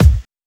Kick, Drum Hits

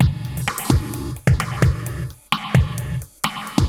Moog Phaser HR-16B (130BPM), cyborg beats

